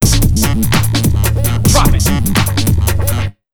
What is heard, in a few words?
AMBIENT 147, Big Beat Drumloops